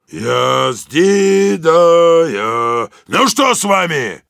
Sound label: singing, ru; Heavy